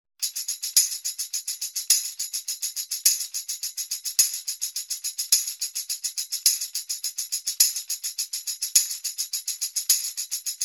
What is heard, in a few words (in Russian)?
eshhe muzykalnyj ritm